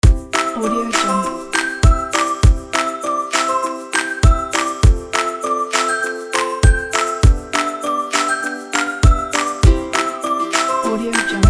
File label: upbeat pop fun ukulele